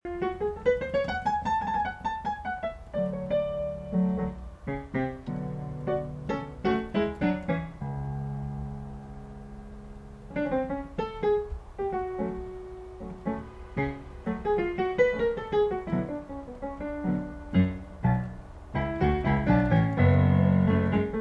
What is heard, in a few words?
moscow tango silly